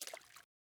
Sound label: water step; teardown ps5 windows xbox series xs gamerip 2022